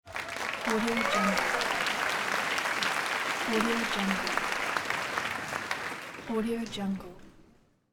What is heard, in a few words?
applause